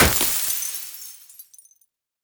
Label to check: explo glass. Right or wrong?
Right